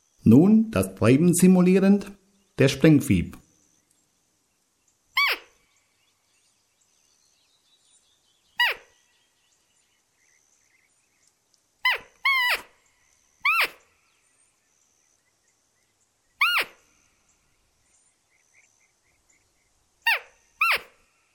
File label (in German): Sprengfiep